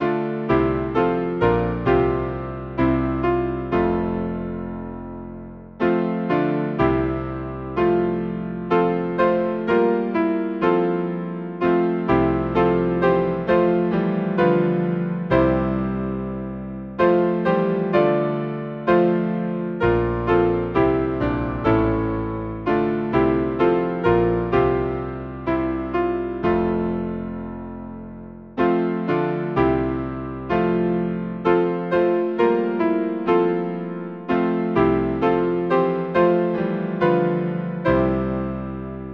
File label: Hymn, piano, Omni Die; For the bread which you have broken